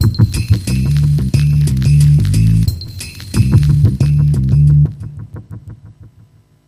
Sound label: Scratchout